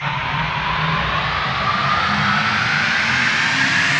Grow; Blastmorph Pinball; xbox series xs

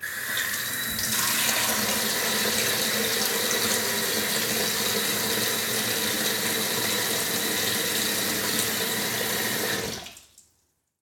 Sink Tap run

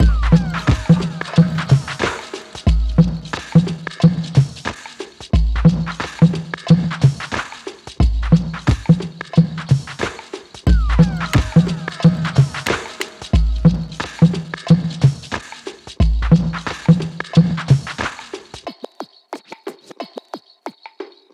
Music Save Loop; ios macos gamerip 2022; subway surfers tag; Meta

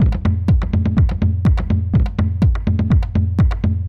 Beat

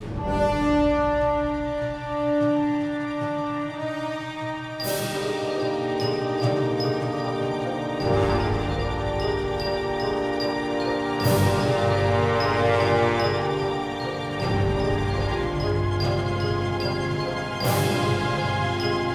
really scary ghostship; pirate mysteries android ios macos mobile windows gamerip 2012

